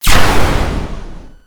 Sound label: high pitch laser